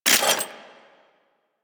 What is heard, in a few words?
Grenade Launcher Mech; Warframe; Weapons; ThanoTech